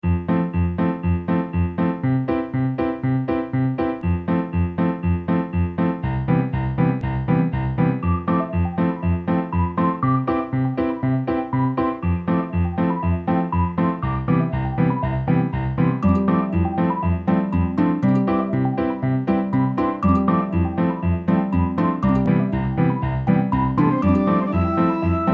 bgm lobby; link match android game music

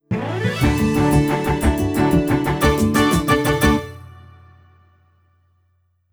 Airplane Success Jingle; Pilotwings Resort

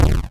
FLAMER SHOT; Super Battle City 2

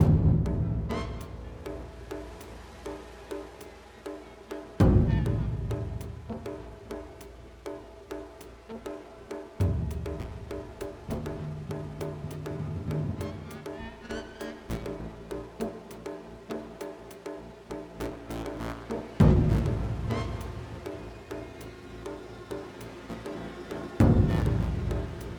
Music Gameplay State Tension; Music Hideout1; H1 Level Area=Building Nav

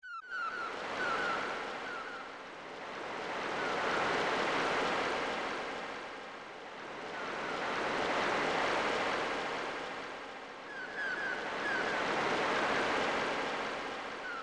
Ocean Waves; marvelous; snes

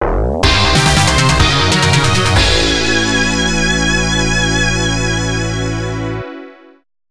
Game Is Over (game over); SevenTailsButterfly

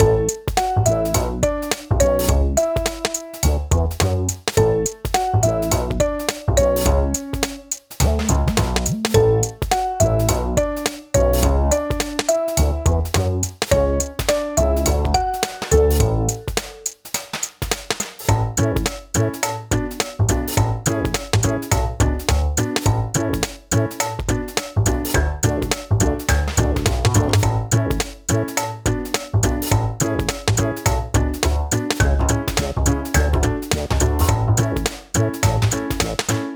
Casual Title Piano Loop

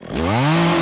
chainsaw start cutting; Lucius II The Prophecy

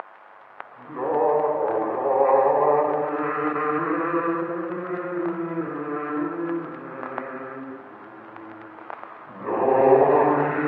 Imp Chanting

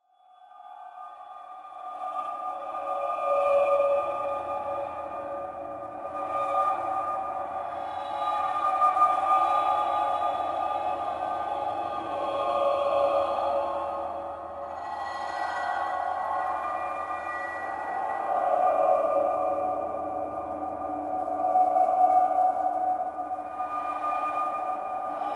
Pizzaplex Ambiance; Five Nights at Freddy's; FNAF Security Breach

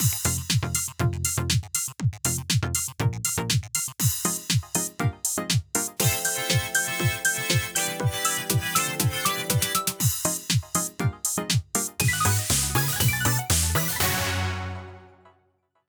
Modelwalk BGM Replay

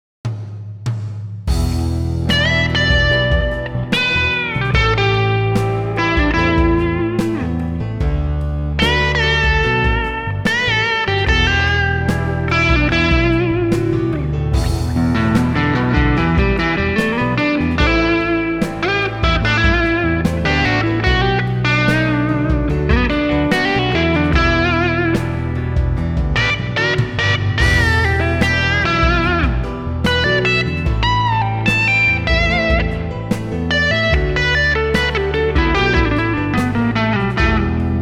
Blues; Serious Sam 4; Saratoga

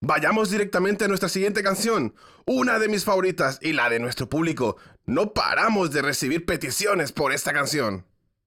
Music Vehicle Radio Events

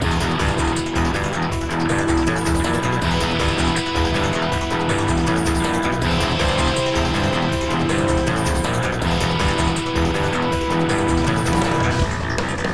Battle LOOP, soundtracks

